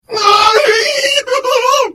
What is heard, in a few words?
Hit; The Elder Scrolls III - Morrowind